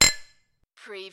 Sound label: Glass clink, two thick glasses clinking together